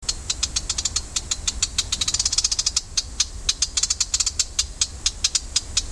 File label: Acrocephalus aedon worrying near brood